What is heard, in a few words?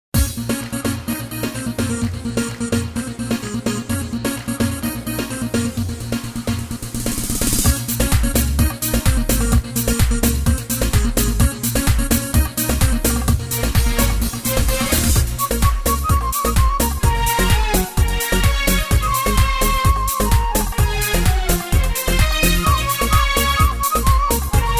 pop